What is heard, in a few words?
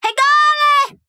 Fight Yell; Hornet